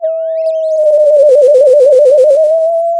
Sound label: Sound of a police car siren